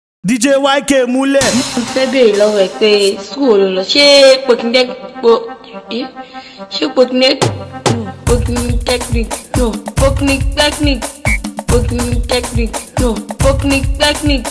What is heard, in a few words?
Cruise Beat Instrumental